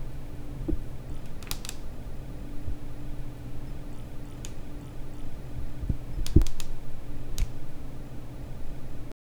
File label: briefcase latch click